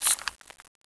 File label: hk416 silencer off, weapons; cso